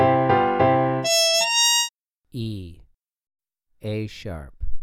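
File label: Muted Trumpet 2 Note Melodic Major e4 a#4; ear training; melodic ear training for musicians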